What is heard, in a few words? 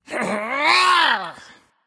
nv grunt lift; cupid